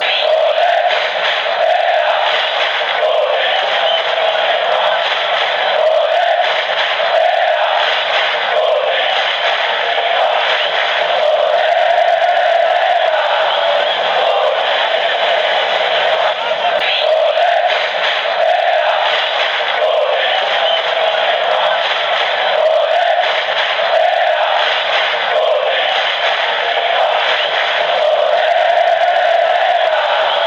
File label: olelea, fanchants; coritiba